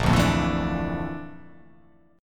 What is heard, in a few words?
Bb Major Sharp 11th Bb down, chord; strummed; JGuitar